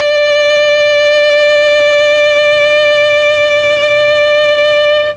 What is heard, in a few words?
D4 viola, mellotron